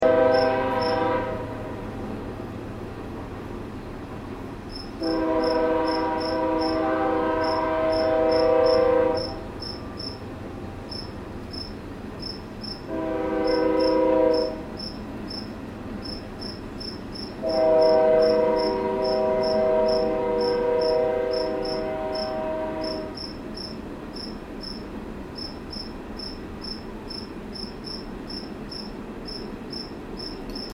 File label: cricket train